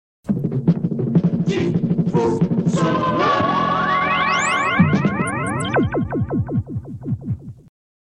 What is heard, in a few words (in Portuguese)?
Vinheta, radio; Radio Difusora SP